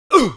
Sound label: damage, pain; mohaa; dialogue